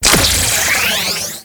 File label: Golem Trial Inject Antidote, UI; J3 Golem Trial